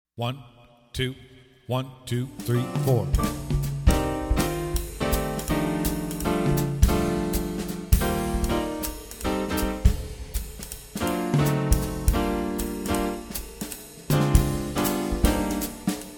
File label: Bass Audition Track